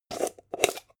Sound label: jar plastic midsize open short